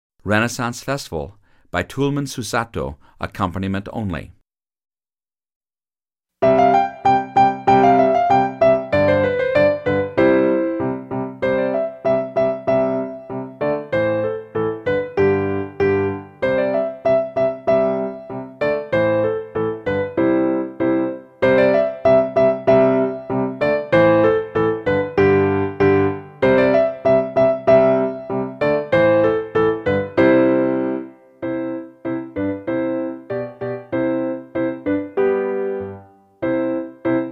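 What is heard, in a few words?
Horn Accompaniment only; French Horn – Piano Only – Performance Tempo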